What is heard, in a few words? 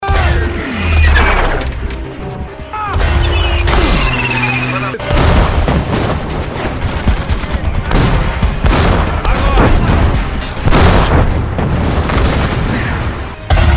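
war, bombing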